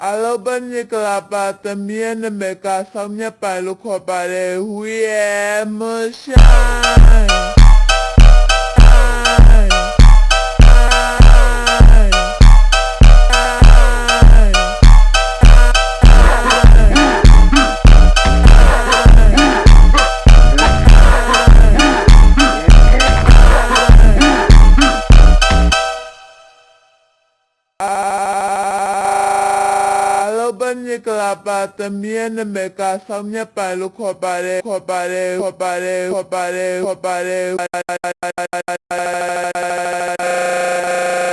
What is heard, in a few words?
funk Ultra Slowed